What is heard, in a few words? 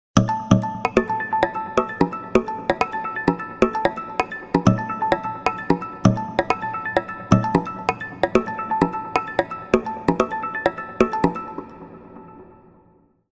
Tribal, ringtones